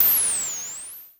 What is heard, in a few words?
Dual-Percussion-Synthesiser; Klone